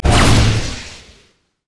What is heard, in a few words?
passive buff; LoL; vi